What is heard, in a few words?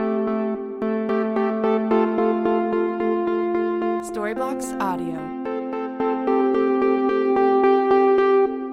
Ambient House Synth 110BPM A